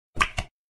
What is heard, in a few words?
button click on sound effect